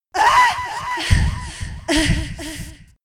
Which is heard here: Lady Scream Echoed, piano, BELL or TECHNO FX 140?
Lady Scream Echoed